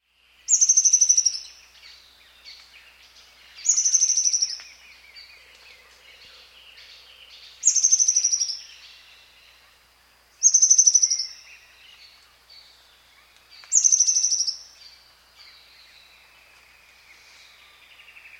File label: Ornate Antwren; S. America